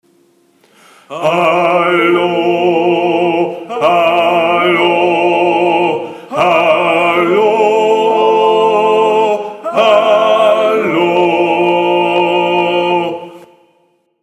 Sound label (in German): Hallo TENOR, Gesang